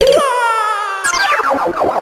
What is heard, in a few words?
sound event death; omm-coop; Mario; sm64coopdx